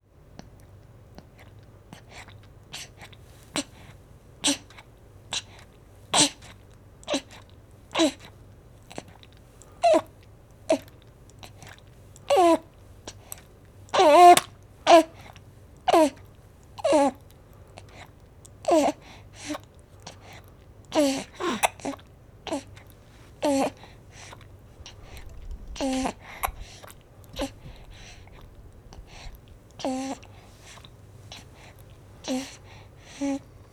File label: Baby drinking milk